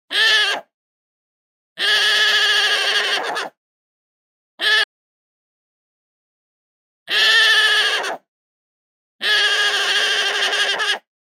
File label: alpaca snorting